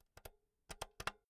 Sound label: insert jack cable slow